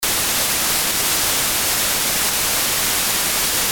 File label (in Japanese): ノイズ